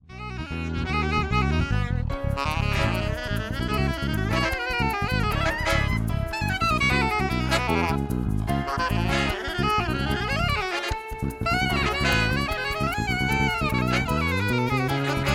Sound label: Drum